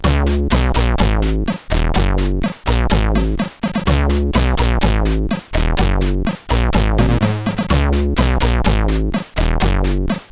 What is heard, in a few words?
Logo, CSG8580R5; Atrix